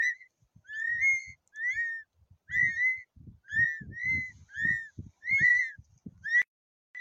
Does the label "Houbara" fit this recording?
Yes